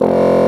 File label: Cntr Bssn; F0; Multisamples; Digital Sound Factory Virtuoso